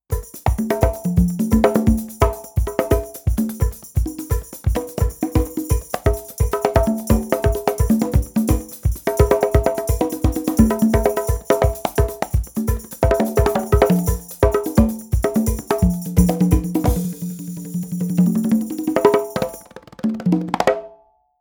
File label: afro conga